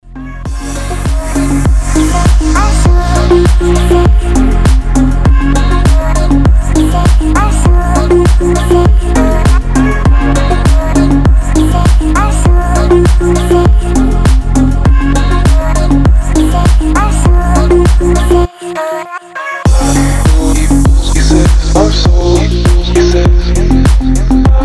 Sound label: deep boogie melodic tempo mix